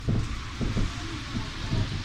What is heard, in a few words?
start, metro